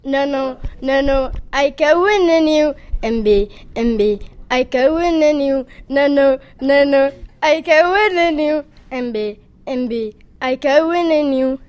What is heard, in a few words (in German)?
Gesang